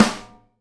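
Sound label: snare bottom DAMP, Live Snares; E-MU Producer Series Vol. 8 – Platinum Phatt (CD 1)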